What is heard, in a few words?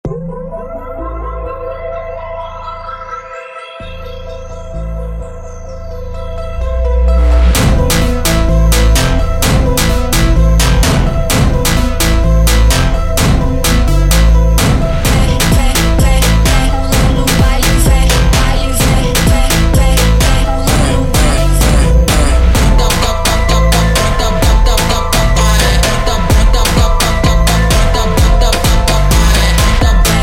Phonk